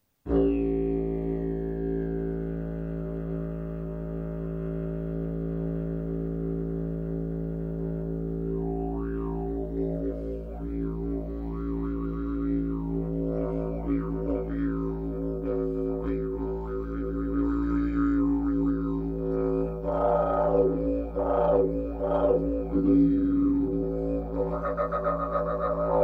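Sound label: pvc A, didge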